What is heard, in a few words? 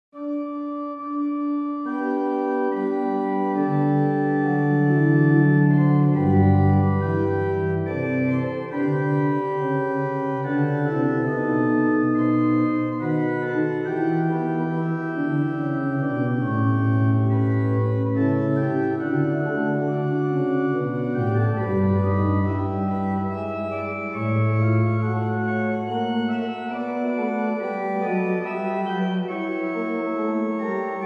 Strophe d hymne in G, Organ Music; Livre Souvigny